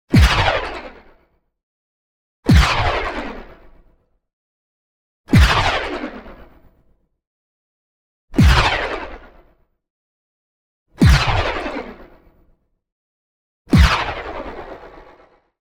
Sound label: NEON ZAP Quivering Burst; boom library